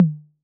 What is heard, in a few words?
Tom Mid C 808; Clean; Digital